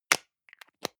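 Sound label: deodorant can lid close hard, badezimmer; haus-garten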